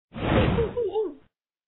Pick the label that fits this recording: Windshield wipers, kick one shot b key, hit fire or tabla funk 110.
hit fire